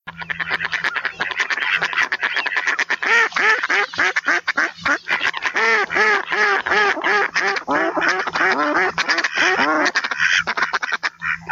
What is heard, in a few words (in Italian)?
GERMANO